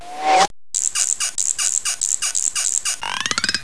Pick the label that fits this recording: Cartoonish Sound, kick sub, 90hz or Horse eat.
Cartoonish Sound